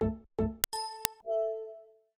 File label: Low Battery, Windows 7